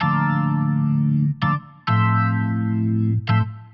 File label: Jazz Keys Organ 128 DA, Chordy Loops, 128bpm